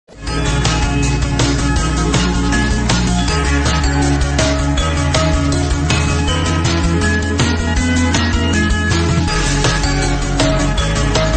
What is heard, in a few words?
Vivo Y27S Ringtone